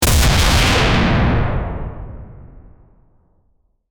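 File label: a shot from a large caliber, alien weapon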